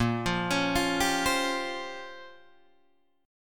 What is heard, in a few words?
A sharp Minor 6th Add 9th A sharp down Guitar Open D, chord